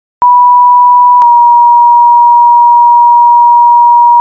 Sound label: Fire Tone modern